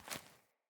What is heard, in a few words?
Grunt Boot Walk Veg, Footsteps, Boots; Enemy; Sinecure; Melee Grunt